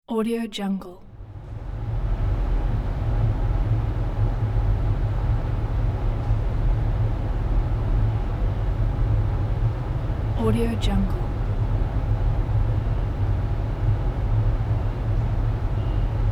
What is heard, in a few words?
school room tone